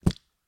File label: hit